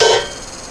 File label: guitar legato